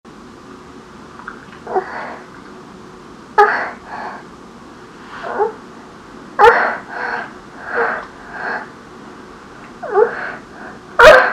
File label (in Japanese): えち音声